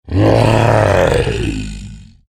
クリーチャーボイス

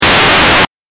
8bit 消滅

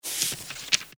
ページめくり

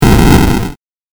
8bit 爆発

